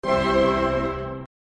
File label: Flute Harp Concerto last chord